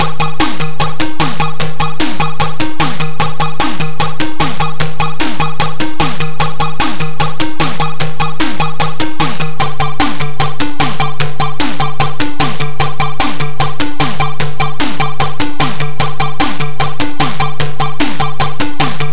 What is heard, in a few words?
Minigame Jingle; RoboCop. Minigame Jingle; CNGSoft